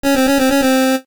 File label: 8-Bit jingles; NES; kenney; music jingles